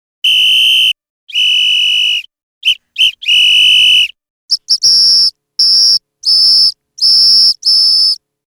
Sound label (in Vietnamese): Tieng coi trong tai